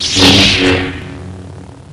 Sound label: knife deploy